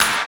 clap, claps tuned; Roland L-CDX-01; Clap & Snap

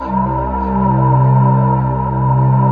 DEEP BRTH, BREATHY; Synth

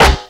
RIM; Big Beat Samples & More; AKAI

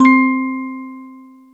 music box

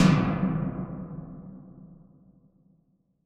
Tails, Drum Bits, Verb Tails

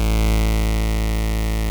OB VOX A2, Synthesizer; Hollywood Edge - Giorgio Moroder Rare Synthesizer Collection; OB 4 VOX.

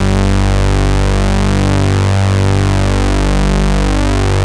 MOOG BASS G2; Best Service Dream Experience

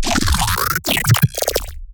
glitch stab; Virtual Riot; Glitch Stabs

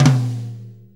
6-8 Tom Flam; 6-8 Loops; Flams; Drum Tools

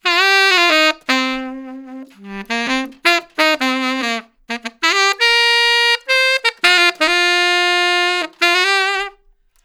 Tenor Solo, Jazz, Traditional, Tenor Sax; Sonic Foundry (Sony Creative Software); Jazz Solos and Sections; Tenor Loops

